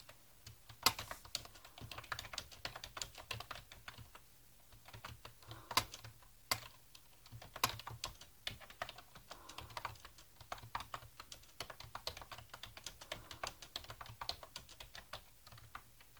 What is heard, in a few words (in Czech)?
Dell multimedia, klávesnic; Dell Multimedia Pro USB Keyboard